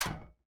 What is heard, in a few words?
big metal on; NASCAR Thunder 2004